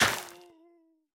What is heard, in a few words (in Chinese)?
灵魂土破坏声